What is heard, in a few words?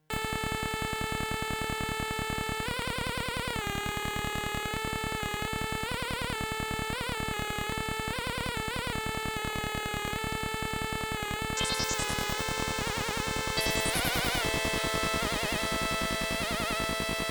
dissonant pulse